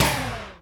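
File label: Gut Wrench, Drum Machines Alesis D4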